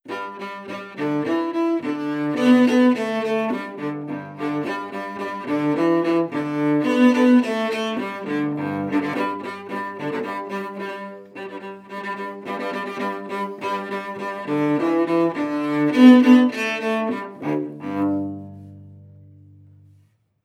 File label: wood cello traditional old mcdonald sequence